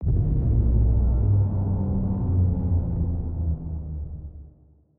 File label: ship colossus close; ships; Stellaris; shroud